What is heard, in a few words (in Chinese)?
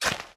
锄耕地声